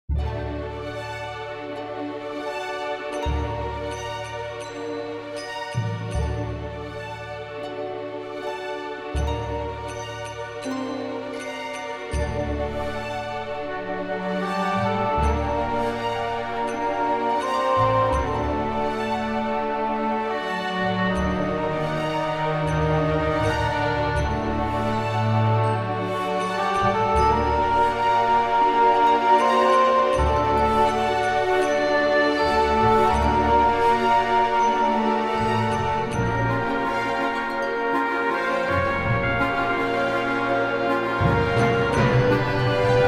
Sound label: Orch Magic and Mystery